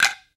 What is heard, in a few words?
ONLONG, World Percussion